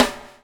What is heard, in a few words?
BUBL; Spectrasonics - Backbeat